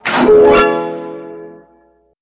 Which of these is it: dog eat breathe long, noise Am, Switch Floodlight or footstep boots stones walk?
Switch Floodlight